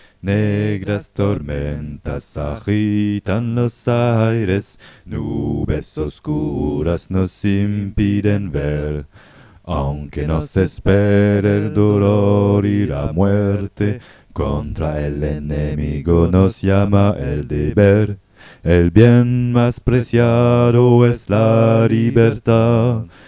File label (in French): bass et lead; Negras Tormentas; barricadas